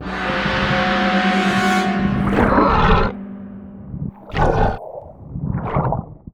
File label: Creature Hit Stomache Anim